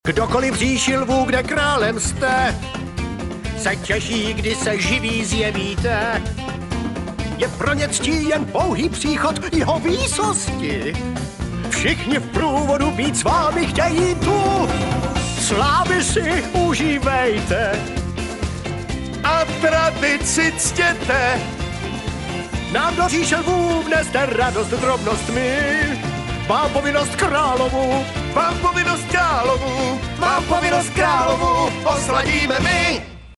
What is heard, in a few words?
Male Czech sing mix